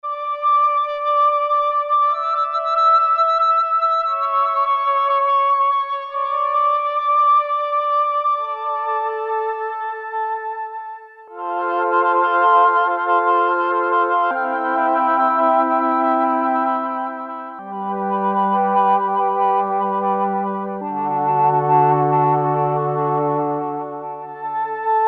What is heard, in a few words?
Vocal Chorus, Moog Memorymoog Classic Analog Polyphonic Synthesizer; 03 Vocal Chorus; Factory programs